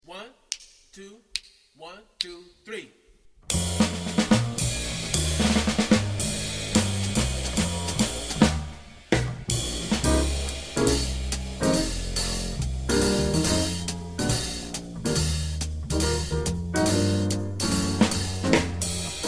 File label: minus melody